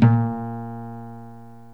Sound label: UPRIG BAS; Kid Nepro; Elite